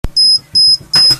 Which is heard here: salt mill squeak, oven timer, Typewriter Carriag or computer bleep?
oven timer